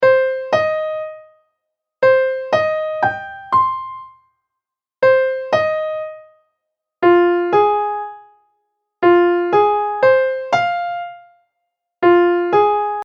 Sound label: min3 Arp Example; Minor thirds: like a minor arpeggio; Interval Ear Training